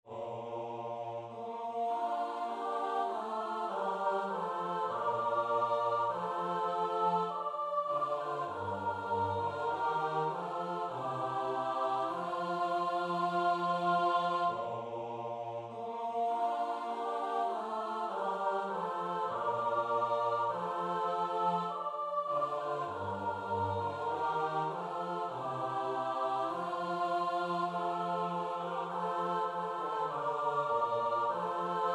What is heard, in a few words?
midi; marenzio; amor e ritornato; trzyglosowe; Biblioteka Utworów Chóralnych przygotowana przez Towarzystwo Muzyczne im. Edwina Kowalika